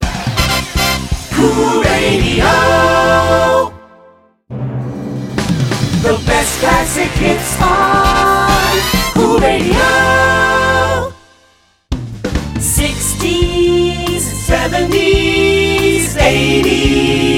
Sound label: Radio Jingle; Kool